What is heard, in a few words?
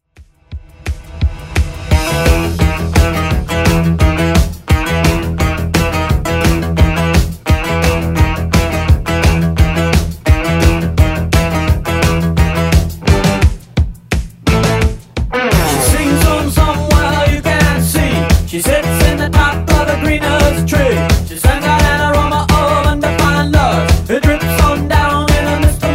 80s Redrum 172bpm